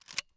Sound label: light open weapon